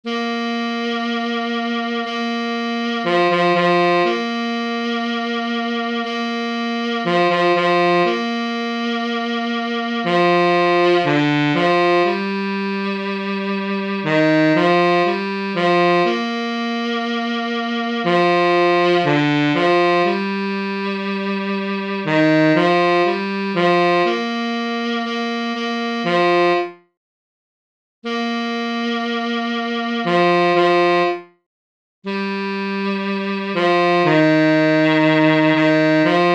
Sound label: tenor sax